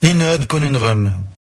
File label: jingle